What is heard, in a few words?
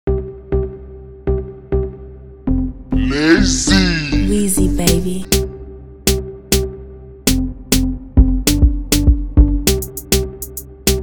Gringo Instrumental